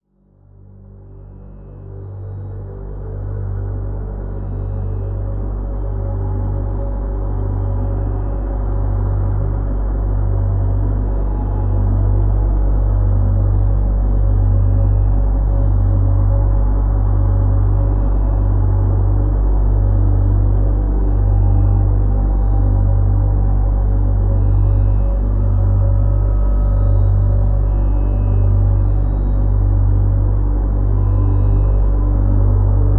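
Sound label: Drone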